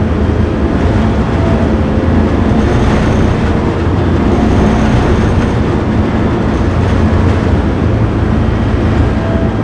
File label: Vehicle Engines; Red Orchestra Ostfront 41-45